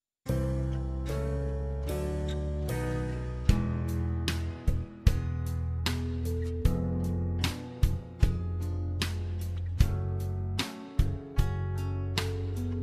(C) No Backing Vocals

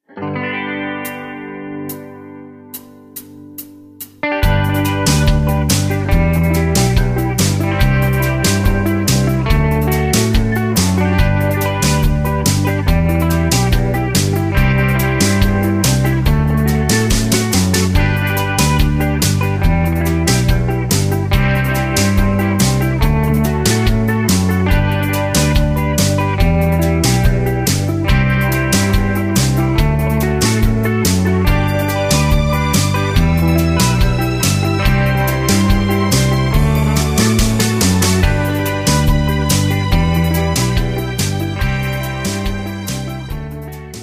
G No Backing Vocals, backing tracks

